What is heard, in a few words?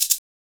SHAKER, Modern Percussion Loops; Soundscan vol.56; AKAI; SHAKER133